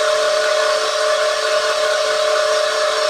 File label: pump; cc0 sfx loops